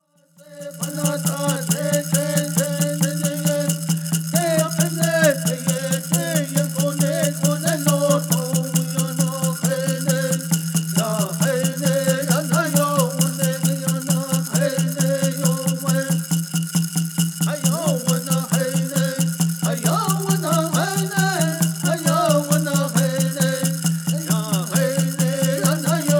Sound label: Peyote Song